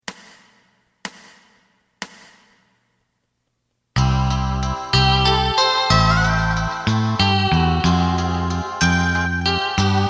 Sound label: drum